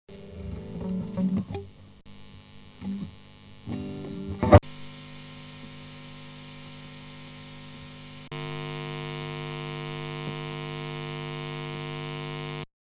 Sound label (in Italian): cable test rumore